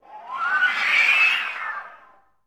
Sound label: FL FX, Advanced Orchestra; Best Service; Peter Siedlaczek's Advanced Orchestra